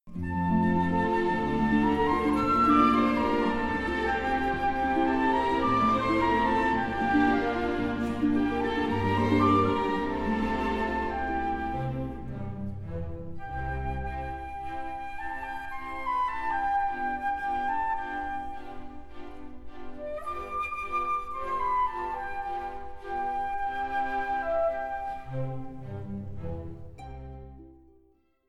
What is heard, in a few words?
Flute Harp Con C